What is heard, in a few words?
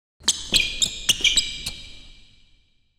basketball sneakers